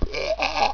bwrahaha

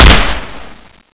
Luger shoot

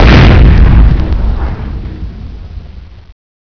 big gun

